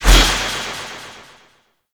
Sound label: Alt Throw; Warframe; Corpus; Spear Gun; BE Ferrox